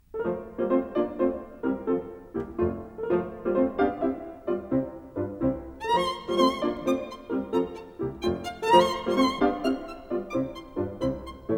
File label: Violin Sonata No. 5 in F Major, Scherzo (Allegro molto); Op. 24 'Spring'